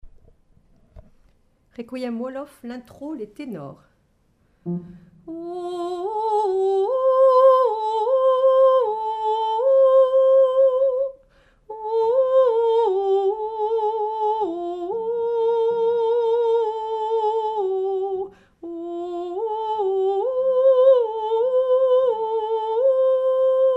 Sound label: Tenor; ouolof; So Vocal